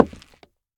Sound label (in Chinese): 下界木踩踏声